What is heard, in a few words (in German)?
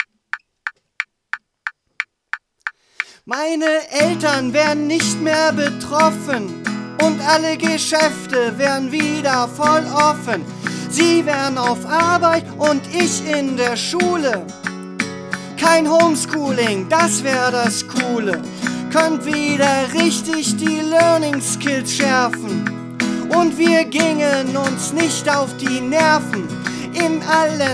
hardcore Rap; corona Liedpuzzle; 5 Strophen